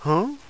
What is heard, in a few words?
huh; Paparazzi - Tales of Tinseltown; Activision